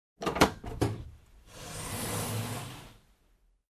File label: Cabinet Door open close